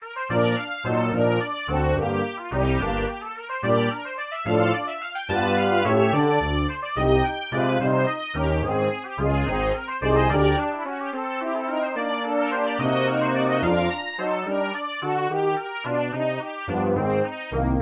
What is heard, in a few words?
country dance